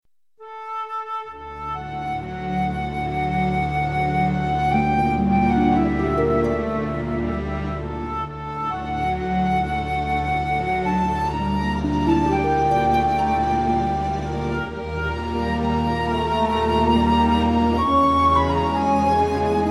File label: flute